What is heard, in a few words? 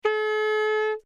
saxophone a4